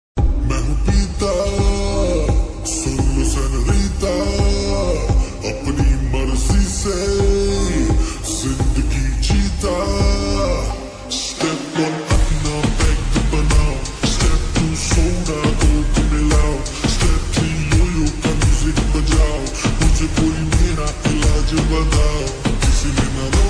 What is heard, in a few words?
lofi remix hindi ringtone